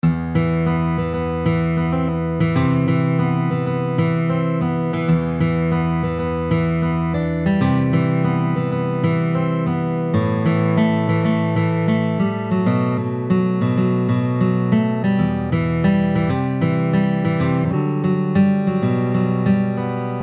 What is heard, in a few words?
riff kuplet